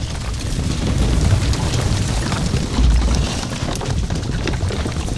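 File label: pwr lg ground loop; smite; pele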